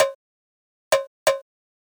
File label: strummer bpm130, drumloops